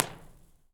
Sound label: Telephone Wash